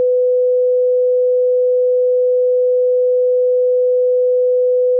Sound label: sine wave